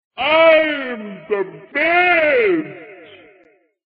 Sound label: toad sleep